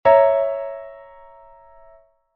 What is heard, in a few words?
A♯°, chord inversions